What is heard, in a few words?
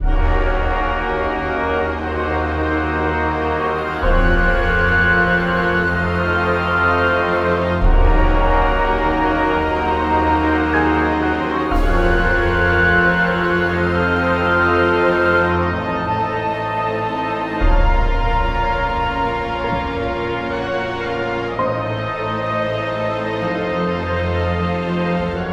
Cinematic samples